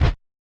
Perc, drums; Touch Loops; BlissedOutBeats

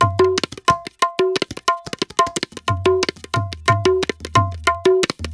ETHNO EXTRA 90; ethno2

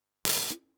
Hats, One Shots; Touch Loops; CosmicFunk

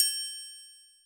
Open Triangle; Drum Kit 02

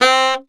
TSAX P C3, Tenor Sax Portato; Orchestral; DirectWave; Varazdin Orchestral

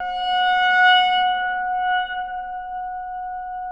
WATERPHONE; ETHNICSTUFF

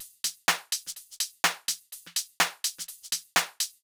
DEEPHOUSE BEATS 125

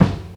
JAZZ BD, JAZZ DRUMSET

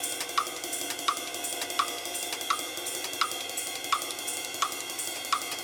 Modern Breaks; Loops; Touch Loops; ClassicBreakSessions

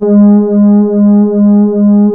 SOFTLO G2, SYNTHS; OBMX-SYNTHS

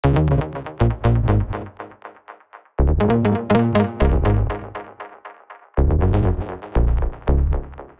120 C High Bass Funk, Analogue Bass; Loops; Touch Loops; CosmicFunk

